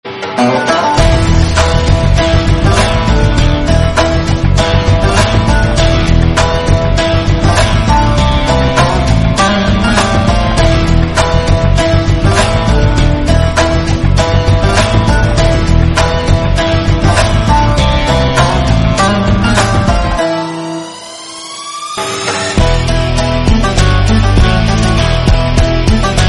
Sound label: famous attitude entry music